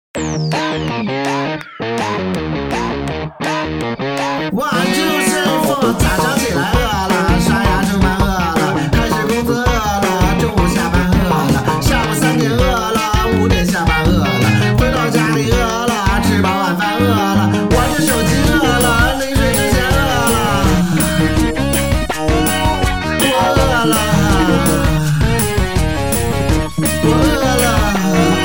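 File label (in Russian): прикольный музон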